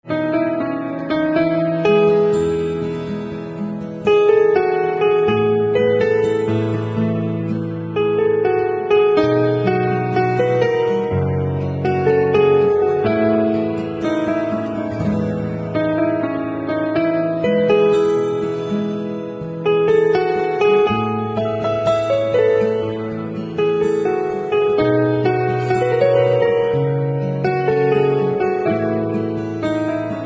Piano Instrumental Ringtone

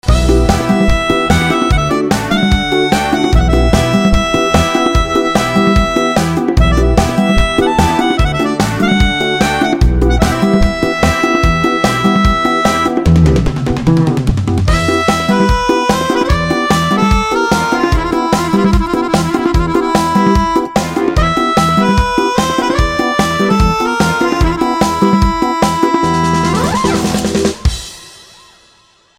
Instrumental Ringtone

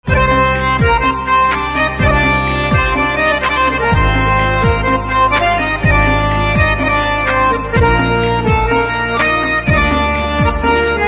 Harmonium Ringtone

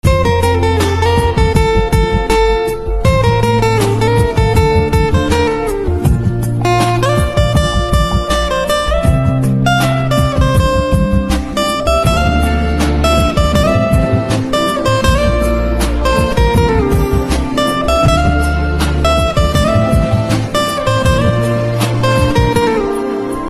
Guitar

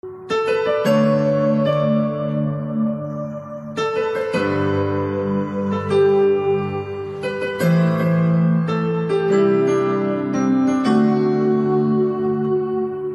Piano Instrumental Ringtone

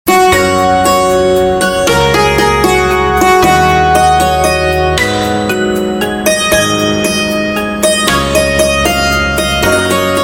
Santoor Instrumental Ringtone

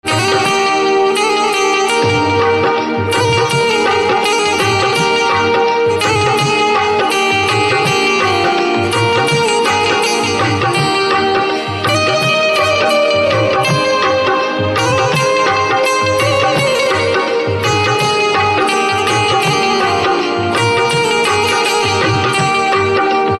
Banjo Instrumental Ringtone

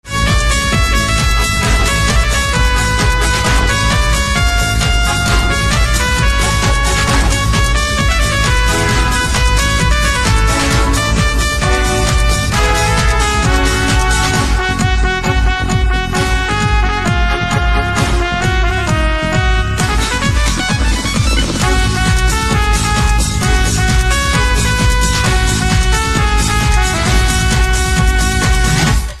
Saxophone Instrumental

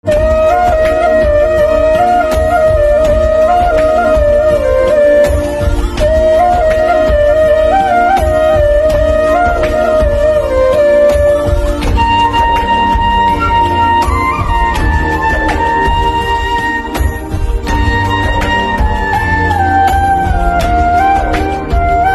Flute Instrumental Ringtone

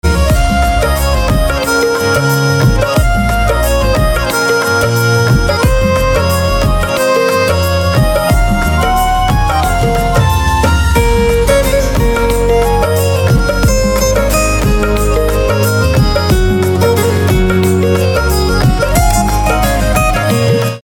MUSIC RINGTONE

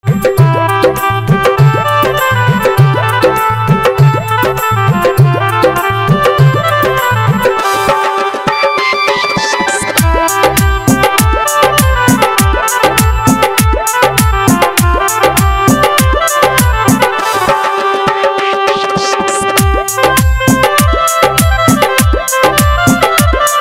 Organ Instrumental Ringtone

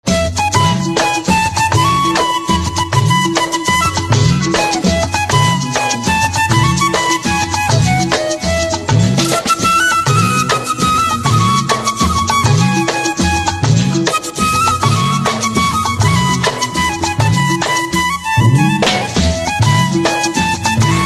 Flute Instrumental Ringtone

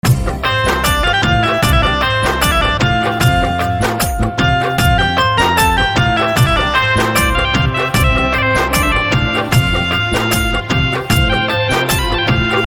Banjo Instrumental Ringtone; bhojpuri instrumental ringtone

